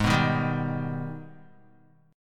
G sharp Minor Major 7th G sharp down Guitar; strummed; JGuitar

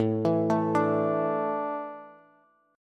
A Suspended 4th, Bass; Asus4 arpeggiated; JGuitar

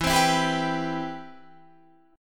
C Major 7th, E, guitar chord

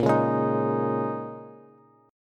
Bb Minor 11th Bb down Bass Bass Standard; strummed; JGuitar

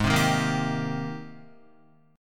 Ab Major 9th Ab, Guitar; AbM9 strummed; JGuitar

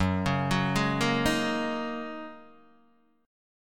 Gb 6th Add 9th, Guitar, DADGAD; chordshape; down

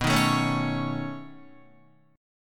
B 9th B down Guitar; B9 strummed; JGuitar

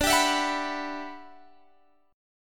E Minor 9th D, down, Guitar, Standard

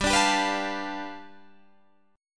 G 9th, Guitar Open D

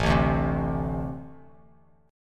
A-Minor Double Flat 5th A Bass Standard; strummed; JGuitar

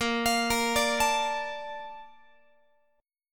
A sharp Major 7th, Guitar; arpeggiated

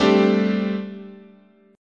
G Suspended 2nd Suspended 4th, guitar chord

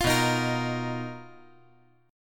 B Major Sharp 11th, 5G,4C,5E,5A

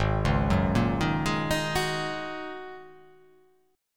G sharp 9th Suspended 4th G sharp

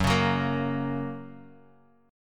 Gb Major Gb Guitar; strummed; 4E,4A,5D,5G,5C,6F

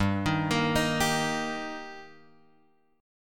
G Minor Sharp 5th G Guitar Drop D, chord

